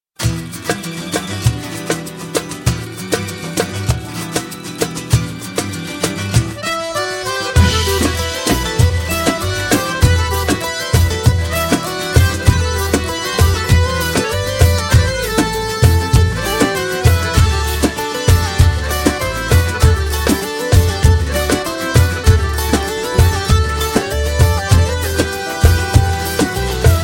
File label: Turkish Pop